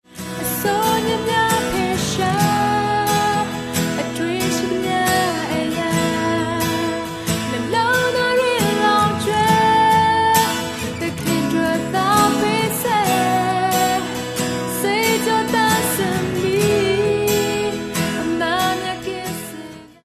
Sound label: bonus song